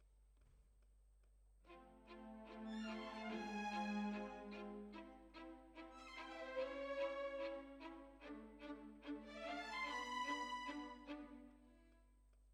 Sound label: Violins, jazz for piano